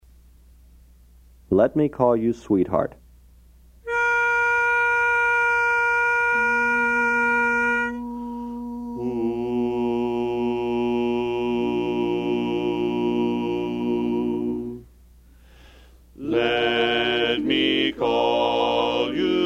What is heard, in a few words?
Bass